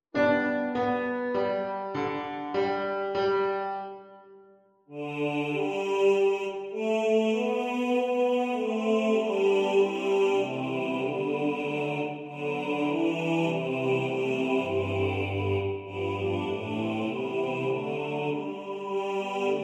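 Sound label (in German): Bass2 im Vordergrund ohne Metronom, Männerchor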